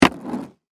Skateboard Land, Sports, Skateboard, Land, Wood, Surface; Skateboarding; BlastWave